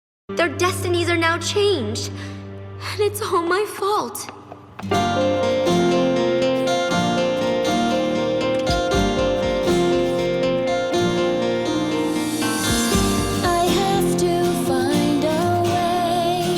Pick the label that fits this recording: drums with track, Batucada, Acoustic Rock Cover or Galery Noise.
Acoustic Rock Cover